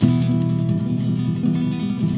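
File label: flamenco chord, guitar; Greytsounds; CLASS GUITAR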